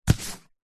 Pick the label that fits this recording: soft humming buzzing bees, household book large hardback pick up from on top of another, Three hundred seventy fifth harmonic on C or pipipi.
household book large hardback pick up from on top of another